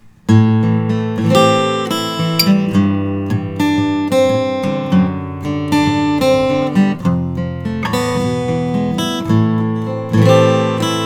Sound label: pirate l 00 all koa